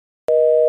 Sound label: call disconnect; erpnext